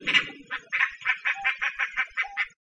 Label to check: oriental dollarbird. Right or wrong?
Right